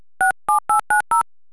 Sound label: dtmf 67890 mono